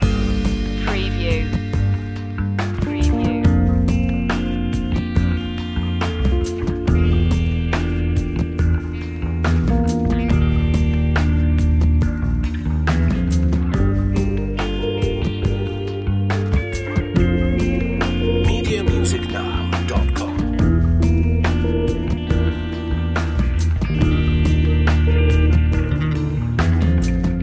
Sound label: ambient magic loop